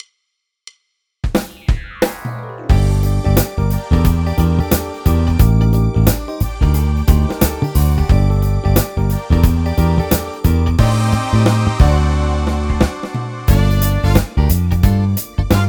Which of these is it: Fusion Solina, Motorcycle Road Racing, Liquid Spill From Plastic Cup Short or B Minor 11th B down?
Fusion Solina